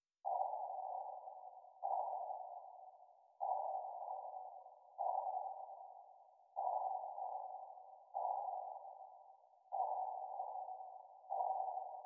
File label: Drum Loop, 152 BPM Percussion; Cymatics; Vibes II Drum Loop Collection; Defendant Drum Loop